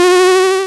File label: tron win; w00tguy; arcade